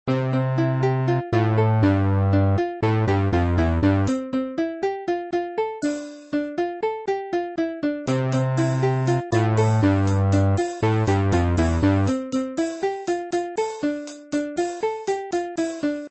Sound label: BGM Menu; Shop Empire